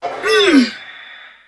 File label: pain grunt, Human; Titanfall 2; Pilots